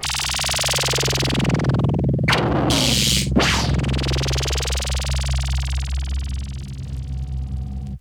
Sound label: alien army, Zaps and Beeps